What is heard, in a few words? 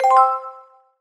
Collectibles Classic FX